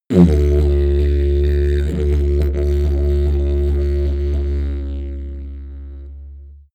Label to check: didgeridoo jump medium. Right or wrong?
Right